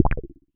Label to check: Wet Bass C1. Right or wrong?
Right